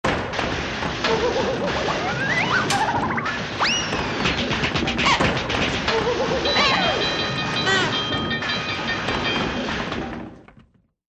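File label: cartoon fight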